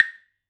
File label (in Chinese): 木鱼; 场景音效